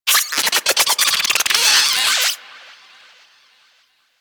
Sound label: Forest Rodent Lure Call Success; Warframe; Hunting Critters